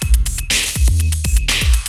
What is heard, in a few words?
LOOPS, TECHNO; Syntec; Wall of Sounds; TECHNO-BOX